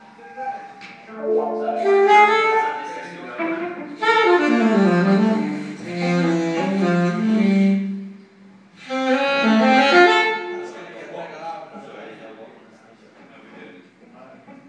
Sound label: soundcheck